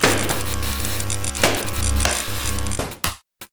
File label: Electric Chair Shock; Lethal Company